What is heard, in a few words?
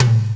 tom A1; ClockWerx Demo Music